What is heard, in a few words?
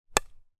toy plastic ball hit with wooden bat